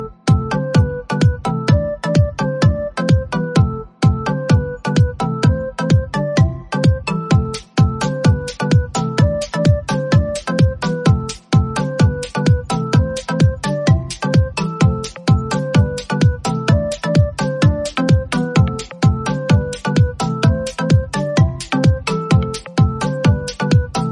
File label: Vivo Orginal Mix Ringtone; ringtones